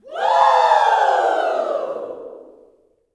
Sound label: "WOO", Gospel, YELL SHOUT; ILIO; Vocal Planet